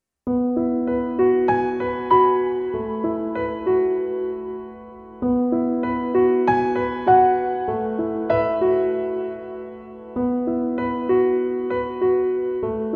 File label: Piano Only